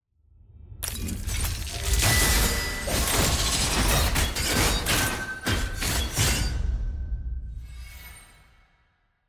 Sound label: level up; champion mastery